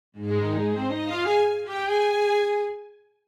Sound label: Mobile game strings victory level complete